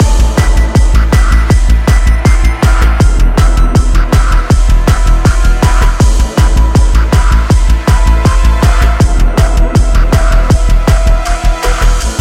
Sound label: Boss floor loop